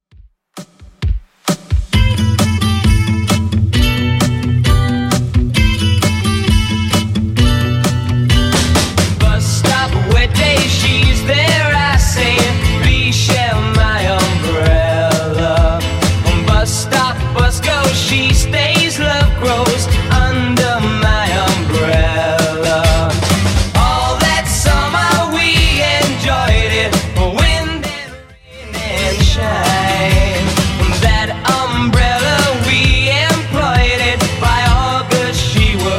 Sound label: 60s Redrum 132bpm